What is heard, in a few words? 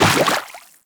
step water, footsteps; Warframe; Teshin